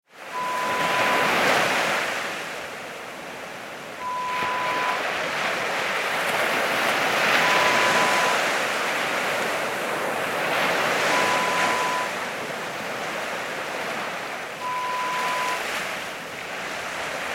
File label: Calm Wave sounds